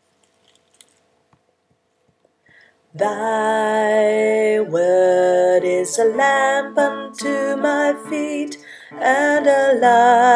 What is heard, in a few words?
alto